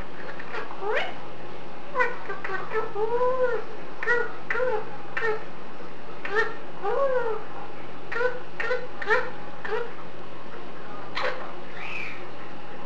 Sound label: what a good, parrot